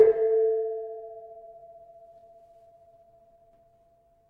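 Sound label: Keno A4, gravity harps tuned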